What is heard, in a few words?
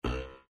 bounce